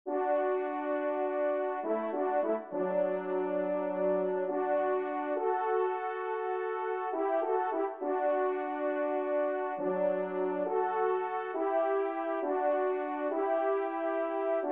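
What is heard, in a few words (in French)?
duo, ensemble, trompe cors; Kling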